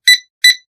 pager

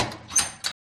Locker Open

